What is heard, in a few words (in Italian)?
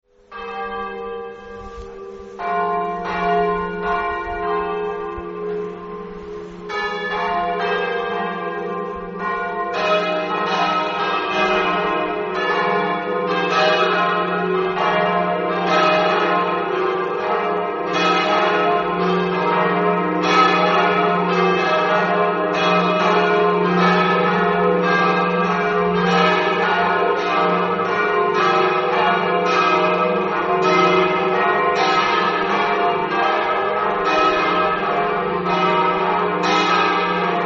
madonna di rosa, campane